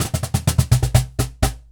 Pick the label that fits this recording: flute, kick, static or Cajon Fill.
Cajon Fill